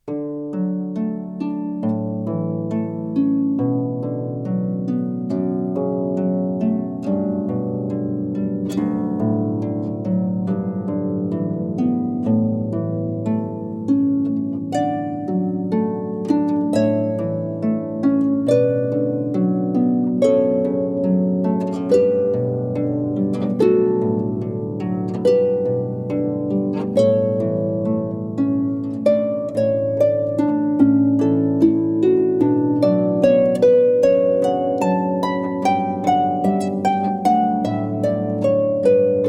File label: harp; harp-samples